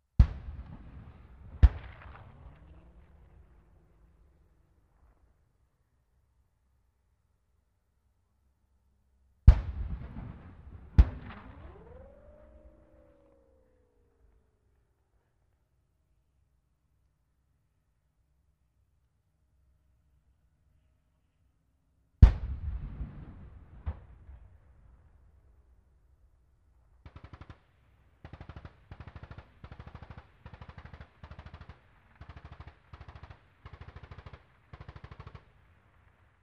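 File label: anti-tank weapon with machine-gun fire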